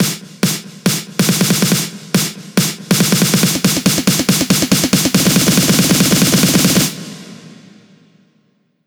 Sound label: 140BPM SNARE 4Bars; Vengeance Samples; Snares&FX Pairs